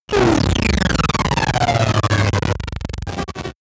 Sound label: break down